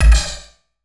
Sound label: Paddle